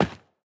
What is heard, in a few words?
reelBounce; templeofnudges